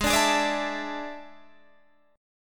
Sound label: A Major 7th Suspended 4th Ab